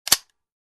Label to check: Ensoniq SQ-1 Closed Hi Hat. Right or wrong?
Wrong